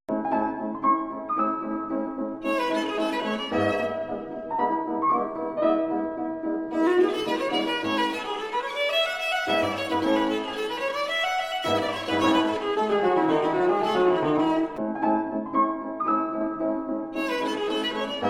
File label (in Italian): Piccolo frammento di una sonata per viola e pianoforte. Otto misure.